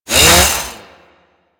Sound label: Ghoul Sawman Melee Swing; Warframe; Grineer Ghouls